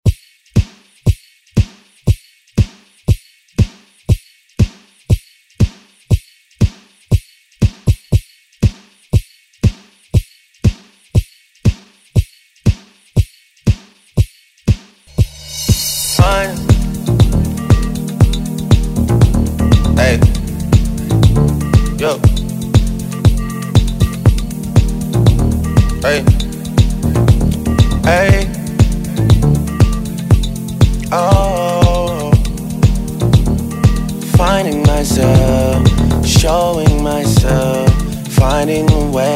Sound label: Top40 Redrum